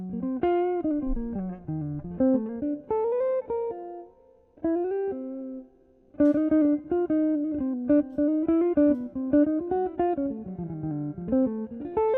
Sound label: GTR